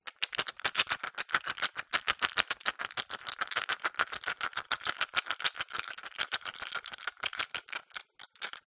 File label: batteries aa shake rapid vigourous slow down at end rattle; skyclad sound